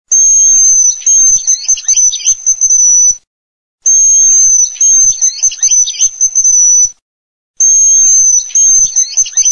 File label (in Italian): FRANCOLINO